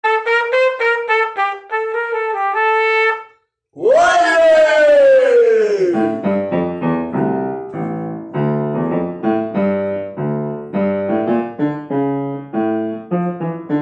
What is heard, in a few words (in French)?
trio musical melodie